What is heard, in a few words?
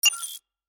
button click